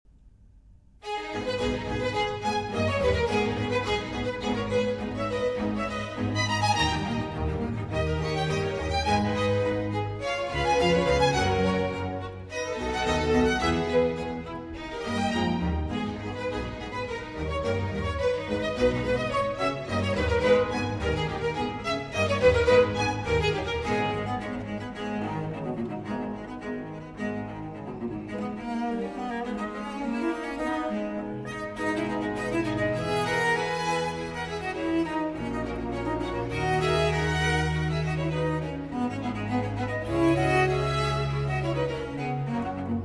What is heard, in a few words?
Cello Concerto in A Minor, Allegro; Vivaldi; RV418; 3. Allegro